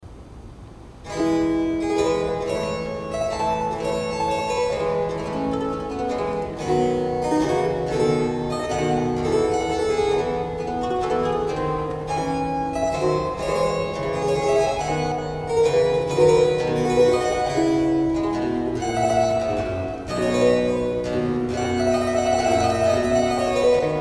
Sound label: Sonata; Bach W F; F 202